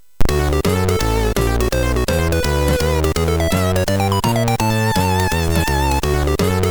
JAZZ, MOS6581R3; Jazz 02

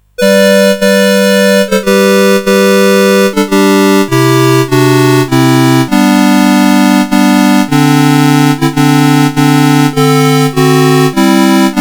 Away in a Manger, CSG8580R5

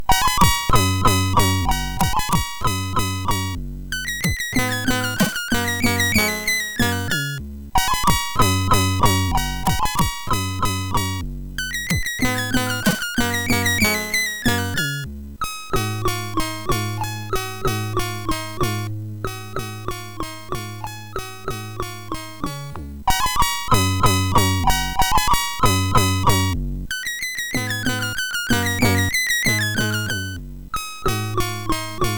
German Dances, MOS6581R4; hvsc

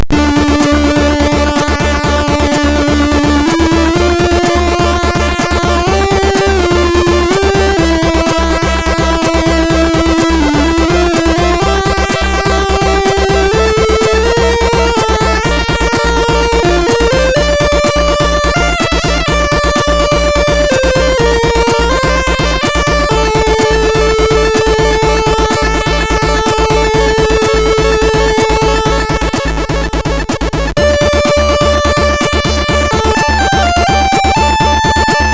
Parallax Percs, MOS6581R3; hvsc

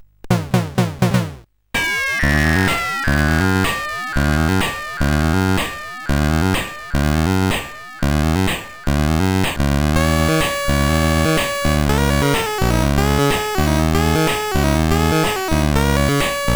Sax, CSG8580R5

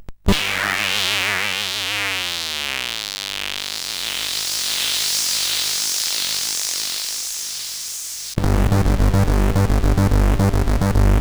Minimalistic Tune, sid CSG8580R5

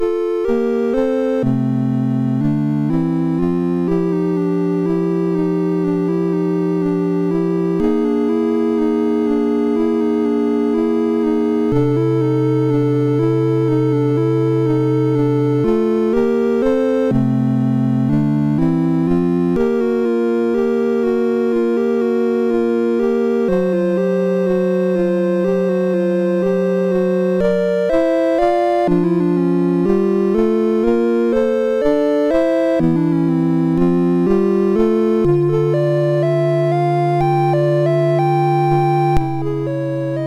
Rhapsody in Blue, MOS6581; MOS6581R3

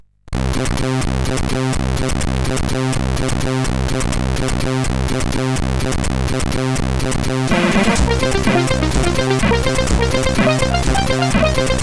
Intro Tune, CSG8580R5

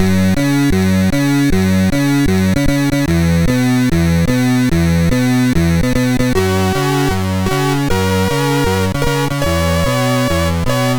End Musix, MOS6581R4; hvsc

